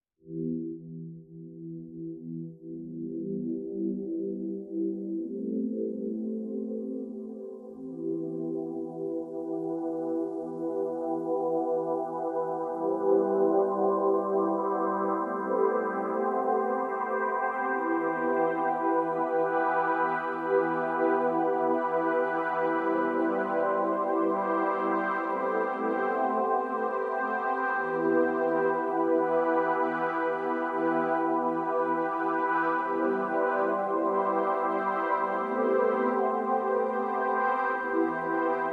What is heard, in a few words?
Precise Sines, Ambient Mix